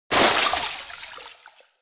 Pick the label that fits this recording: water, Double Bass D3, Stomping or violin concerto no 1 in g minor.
water